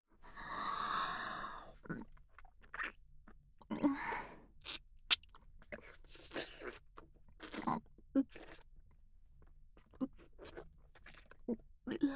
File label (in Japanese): フェラチオ; SEなし